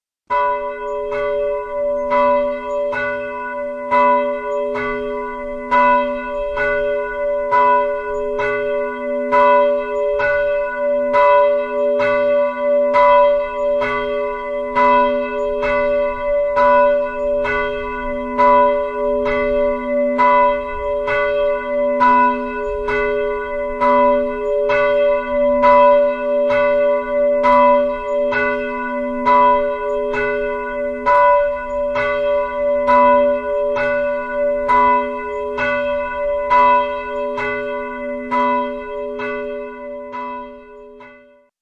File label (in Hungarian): HARANGSZÓ DÉLRE; szignalok; GYULAFEHERVAR